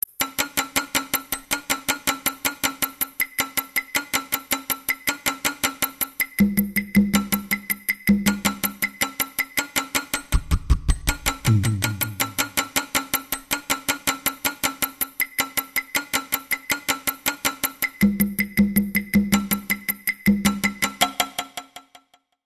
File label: muyu; ゆめ2っき